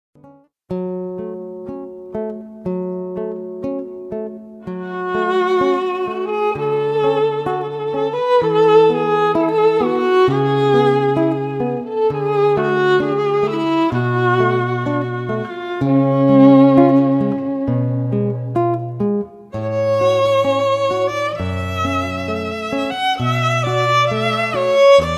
Duo of Guitar &; Pavanne, Faure; guitar violin; Lyrica Demo

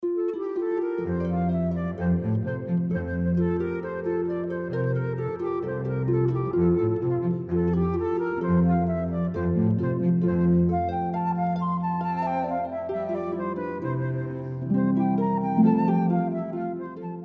Dance, harp flute cello; Gluck

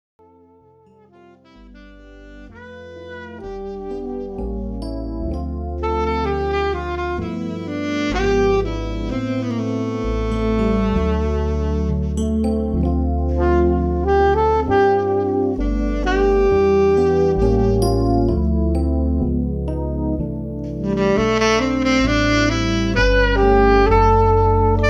Keyboard; Keyboard sax jazz

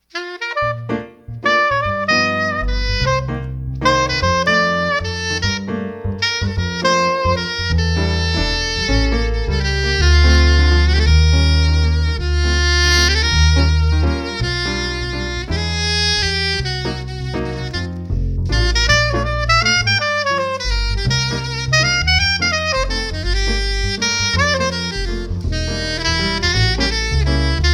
Keyboard and S; Keyboard and Sax Jazz; Lyrica Demo

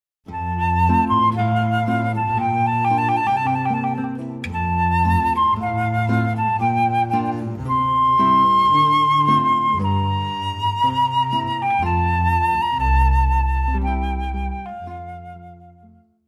guitar flute bass trio; Air From Water Music

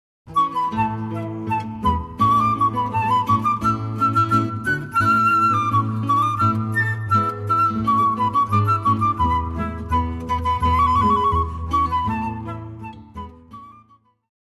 Fanfare, guitar flute bass trio; Fanfare (Mouret)

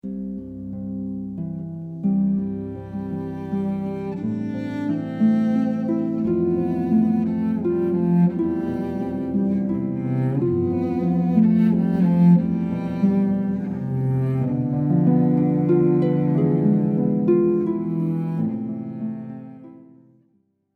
harp flute cello

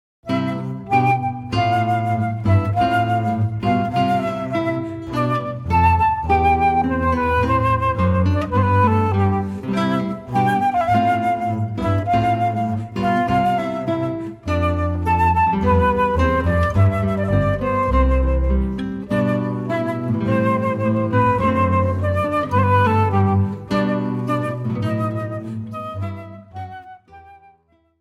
Intrada, guitar flute bass trio

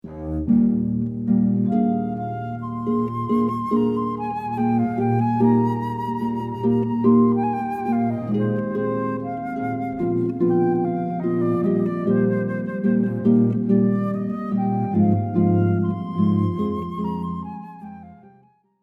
harp flute cello

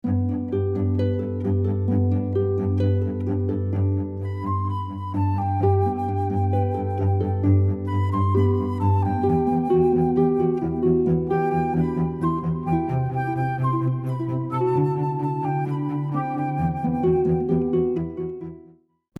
harp flute cello

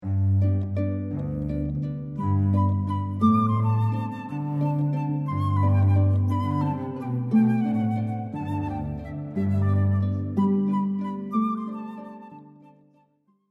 harp flute cello

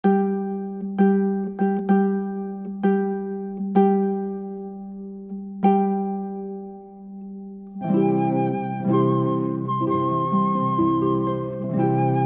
Bridal March, Wagner, harp flute cello

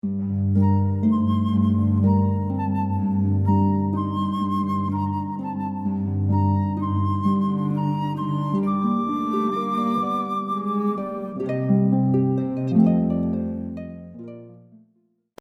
harp flute cello

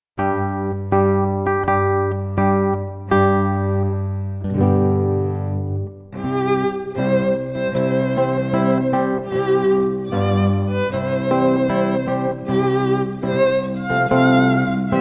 Bridal Chorus, Trio of guitar, violin, cello; Wagner

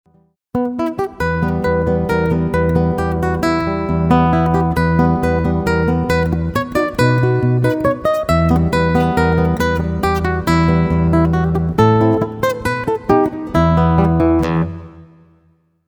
Guitar Solo

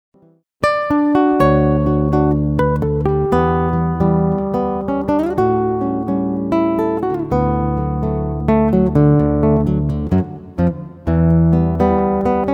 Guitar Solo; Sounds of Bells

